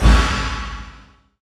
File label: XENON CRH, Industrial; USB Soundscan vol.24; AKAI